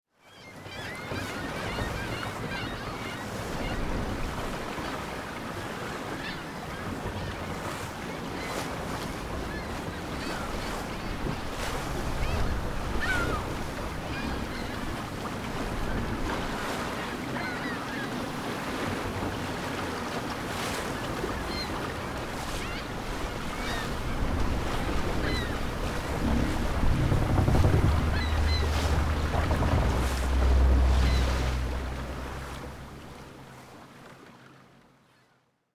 Pirate ship sailing creaky wooden ship

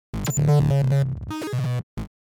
Robot malfunction or power down

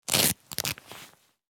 Bulletproof vest straps velcro fasten; military equipment sound effects

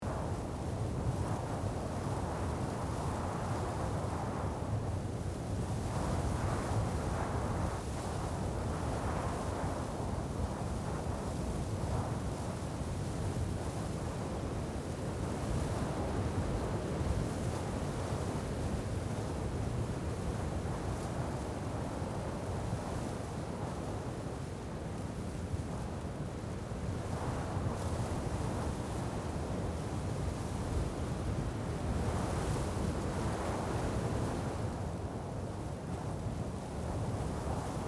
Desert soft wind ambience loop

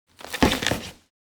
Objects office cardboard box take an object out

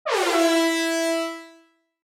Reggae air horn E3

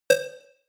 Futuristic button

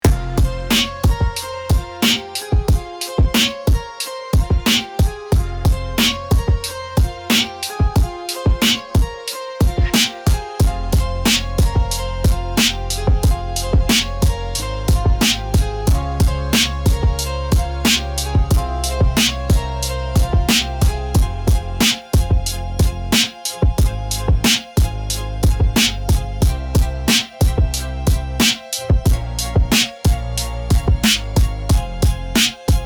Rap beat cold bars only

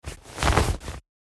Jacket movement putting on; foley

